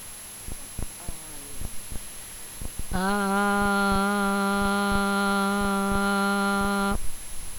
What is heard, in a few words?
aaaa